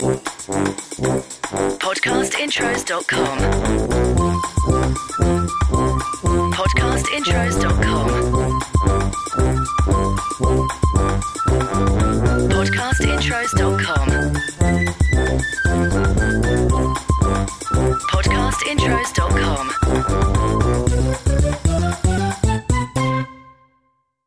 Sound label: CHILDREN; Podcast Watermark